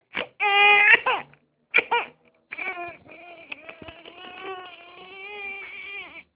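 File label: baby hungry, male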